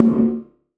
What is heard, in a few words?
Perc, Gated Reverb; creative gate; musicradar